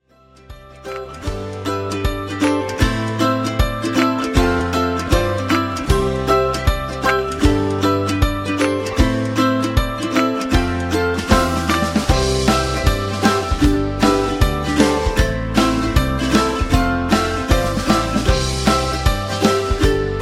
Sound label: music